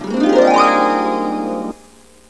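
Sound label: HARP GLE B, Instrument; MUSIC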